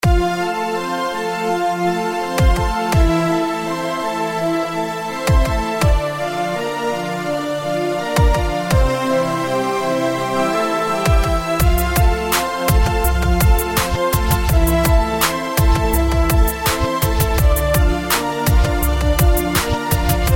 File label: emptiness with beats